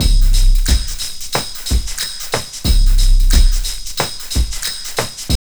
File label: TRIP 90, trip loop